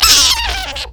sapling charge; maokai

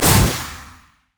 souleater; LoL; ahri

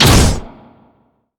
axe grab bump oh; Darius

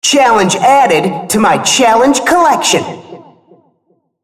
attack; RumbleSkin03

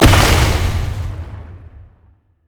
blast; LoL; syndra

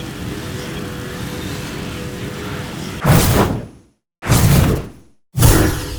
molten shield loop; LoL; annie base

